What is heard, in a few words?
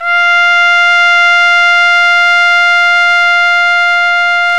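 BRA TRUMPE, trumpet, brass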